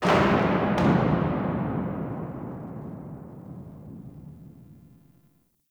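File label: Ocean Distant Bang; Warframe; Grineer Ocean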